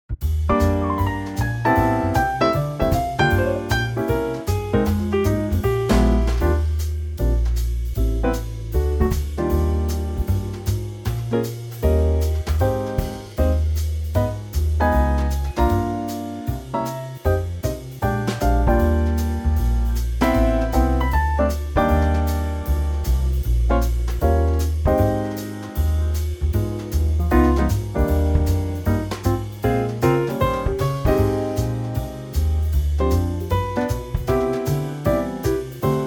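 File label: E flat trio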